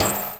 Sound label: metal can drum; nova sound